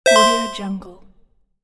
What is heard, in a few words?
achievement; minimal-studio